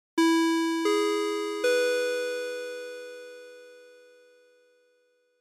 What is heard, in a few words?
Madrid Barajas